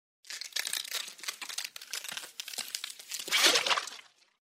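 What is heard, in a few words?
skeleton sounds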